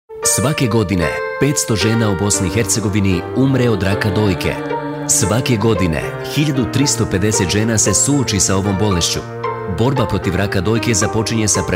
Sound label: Radio Jingle